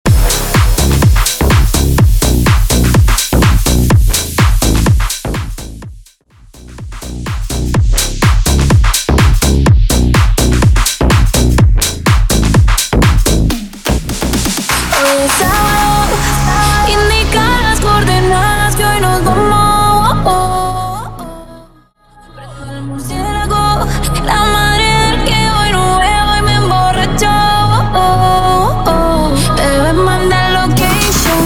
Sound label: Remix Intro Outro Dirty 125 BPM Tech House